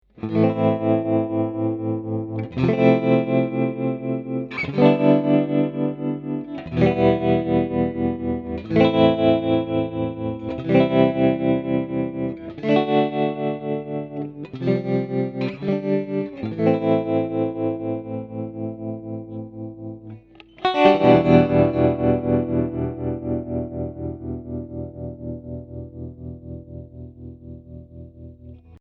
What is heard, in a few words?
tremolo clean